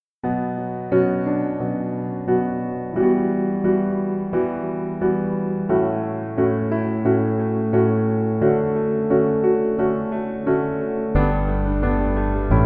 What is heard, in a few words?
Piano